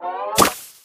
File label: Placing Food; Food Truck Game; Freddy